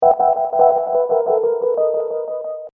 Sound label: Hip Hop Dark Rhodes Licks 90 Bpm Straight E